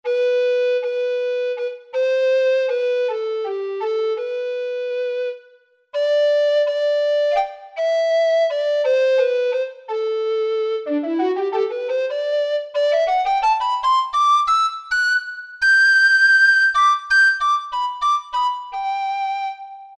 Recorder, Roland SR-JV80-02 Orchestral

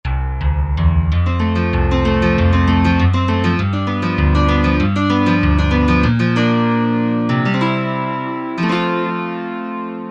Folk Guitar, Korg Wavestation A-D

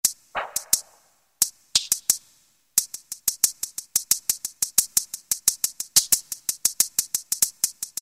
MARACAS; Latin And F.X. Percussions; Roland SN-U110-02

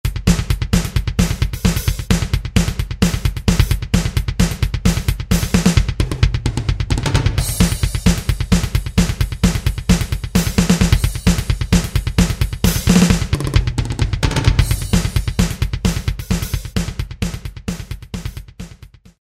Jazz Rock, Alesis SR-16; Preset Patterns

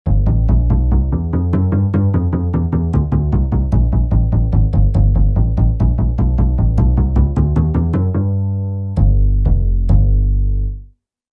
Woody Bass; Factory Patches

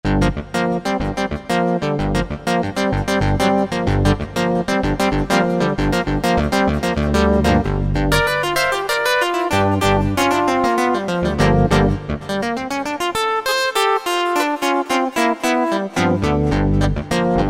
Clavinet, Cheetah MS6

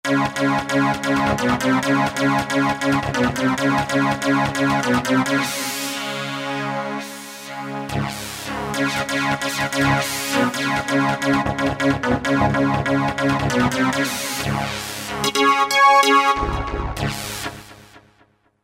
Trangoa Wave, Roland SR-JV80-09 Session

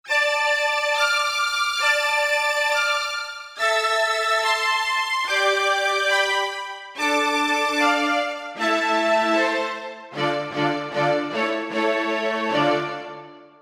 High String; Korg MSC-1S Variety

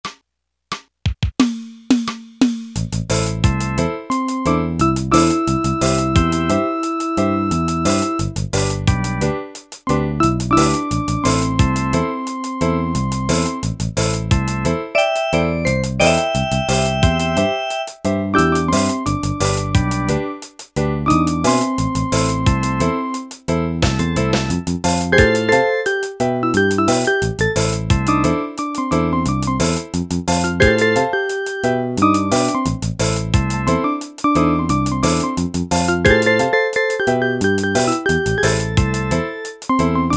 reggae, Yamaha PSR-300 styles

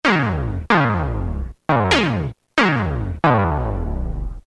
slide fx, Roland M-DC1

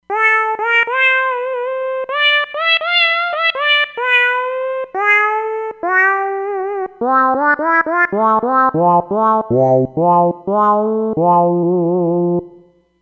Digi vox Syn, Roland Fantom XR

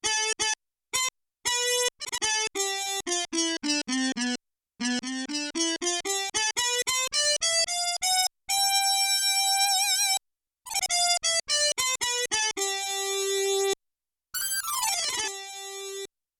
Muted Trumpet; Yamaha TX16W

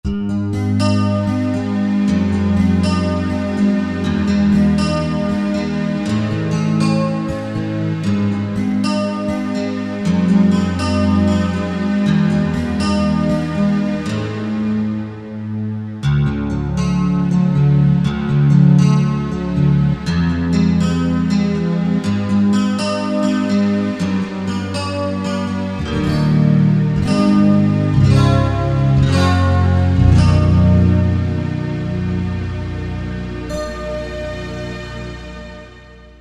New Set Of Strings, Korg TR-Rack

